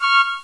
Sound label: pipe